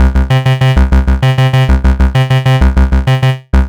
Arp Starter, Underground UK House & Electro, Synths; Sonic Foundry (Sony Creative Software)